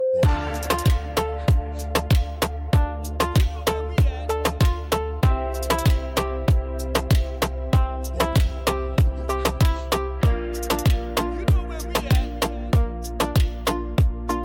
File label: Latin Reggaeton